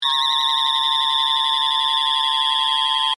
Laser Beam Ray Gun, Stun; Science, Fiction; Laser Sound Effects

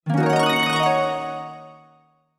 Heavenly Harp, Heavenly Harp Arpeggio Pad Swell; Strings; Blastwave

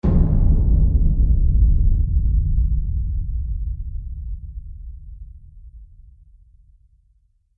Explosion Impact Underground, bomb

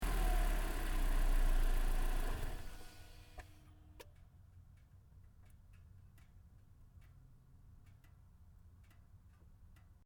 Car Toyota Corolla shutdown engine bay, hatchback; 2009 Toyota Corolla hatchback; Cars (Sound Effects:Vehicles)

